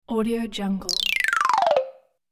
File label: descending bonk gliss